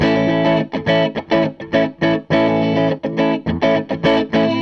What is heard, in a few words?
Gretsch Guitar Loop